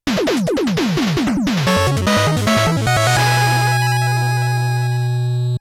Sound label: Stage Clear